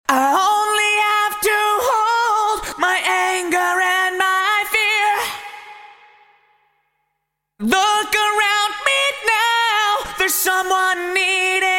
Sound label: Vocal Yamaha SPX900 Vocal Vintage Digital